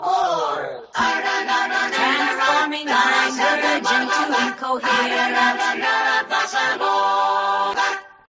Folk Chorus

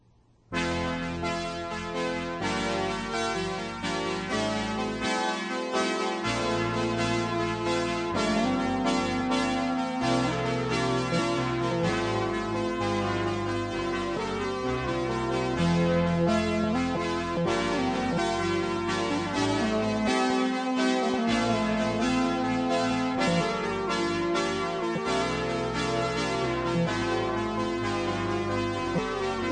square horns + keyboard echo

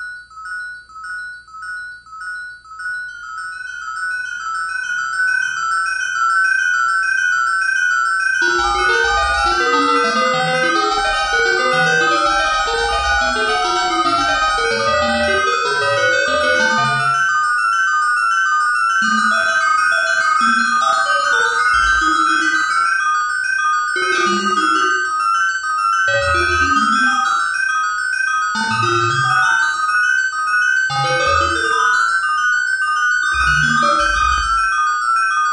P600 Telephone Bells II

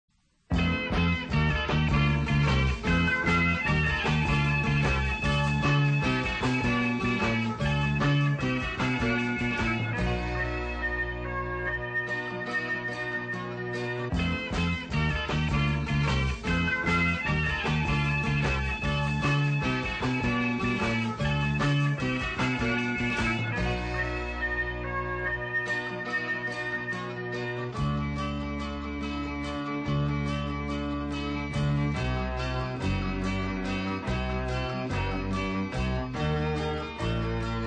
Pop Rock Guitar and Organ; Quaker Life

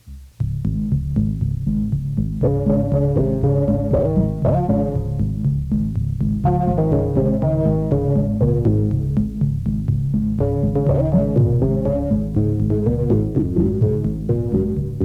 P600 glidey synth

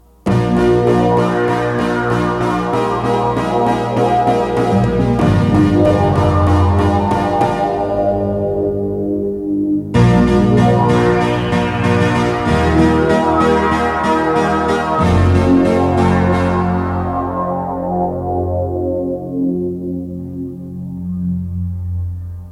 Synthesizer parts

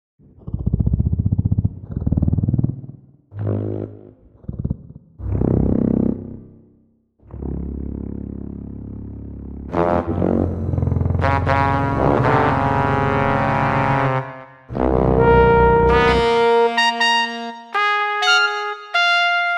horn and bass

